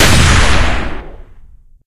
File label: sniper railgun single, weapons; tf